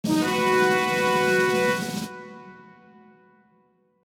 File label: Level Up; habitica; gokul Theme